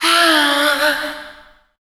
Dance Vocals, FEMALE

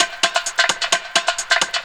percussion, 130, drum loops; Ueberschall; Techno Trance Essentials; TRIBAL

